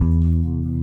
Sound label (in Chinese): Bass E2 开头